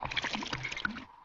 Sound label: drinking sound